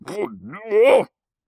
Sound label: Angry; Amanita